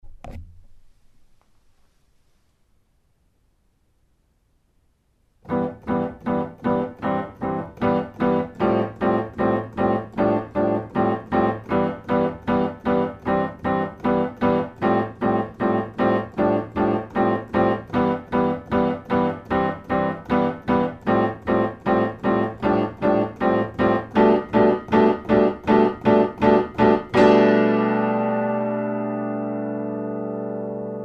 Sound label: Dark Pads